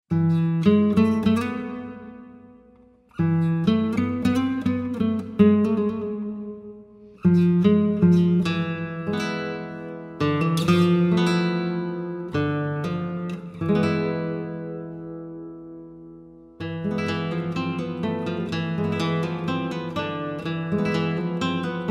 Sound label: Amazing Chill Guitar; CHILL OUT SUAVE